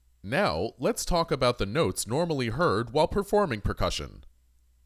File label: Percussion